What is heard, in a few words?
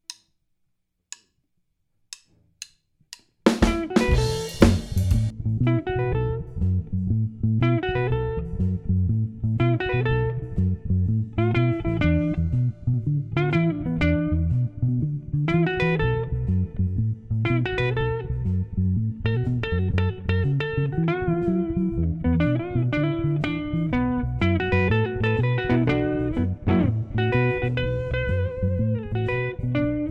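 Box Car Blues play along drums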